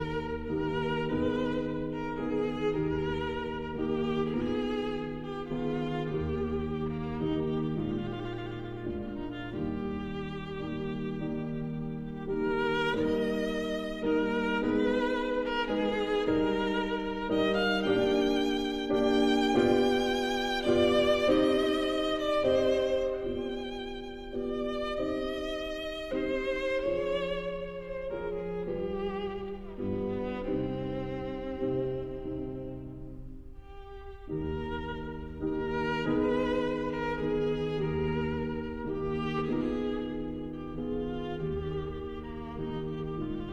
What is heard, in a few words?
Sicilienne for violin and piano